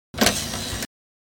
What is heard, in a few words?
DVD player tray open or close